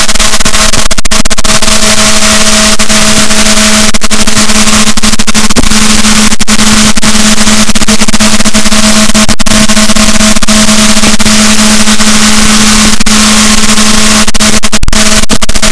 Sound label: rorquals, Balaenoptera Physalus, boat